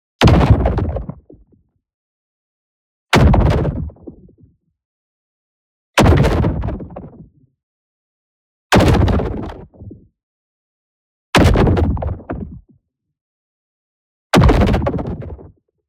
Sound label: EXPLOSION LARGE Distorted Punch Blast; boom library; Matter